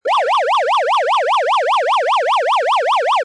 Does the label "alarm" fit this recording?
Yes